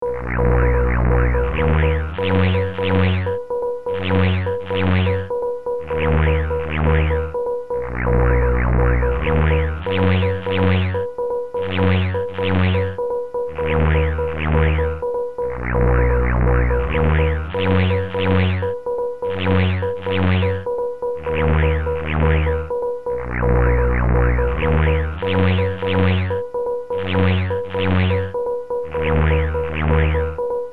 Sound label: Morse Tune; amiga; The Town with No Name